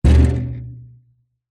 impacts metal trailer side hit knock

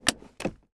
car handbrake release peugeot 307; transportation

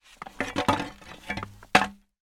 metal pole rusty drag on loose hard surface

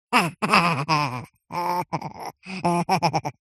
cartoon voice high pitched laugh smug

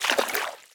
fantasy Footstep Water; little robot sound factory

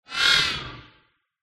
short jumping swish; the sound pack tree

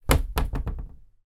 foley wood hardboard thin lean gently on wall

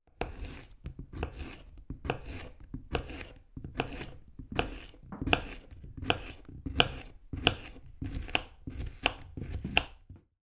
food courgette chopping slicing with knife

